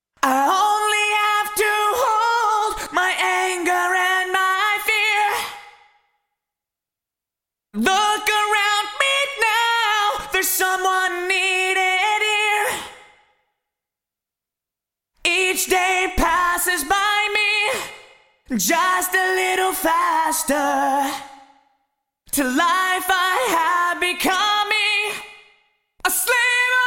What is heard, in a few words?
Vocal Korg DRV 2000 Small Hall; Vintage Digital